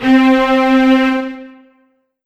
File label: Korg NS5R Strings C4